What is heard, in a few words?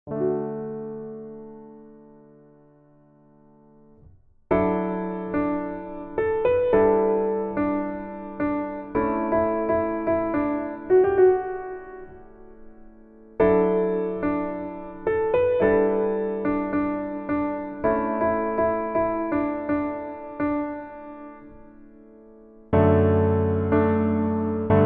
Piano